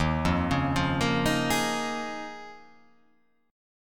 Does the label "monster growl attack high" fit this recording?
No